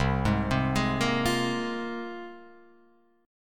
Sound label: C 7th Suspended 4th Sharp 5th, Guitar, Open F; C7sus4#5 arpeggiated